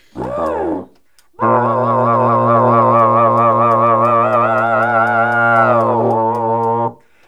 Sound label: GROWL WIND; E-MU Formula 4000 Series Vol. 1; Hip Hop Nation; Booty Brass